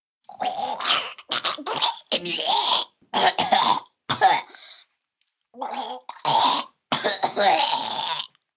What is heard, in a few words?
Baby Spitting Up, Choking, vomit, 1 child, gagging, stuck in throat, evil, human voice, kid; Vomiting